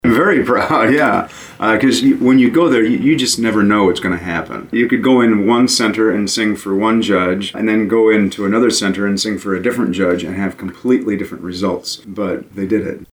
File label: All state choir